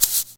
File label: DM5 Perc, Alesis DM5 drum machine